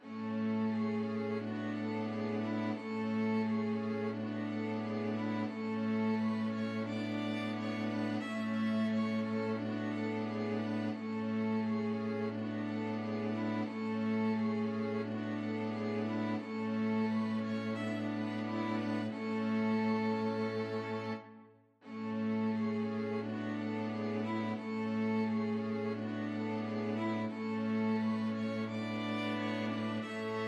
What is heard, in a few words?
string quartet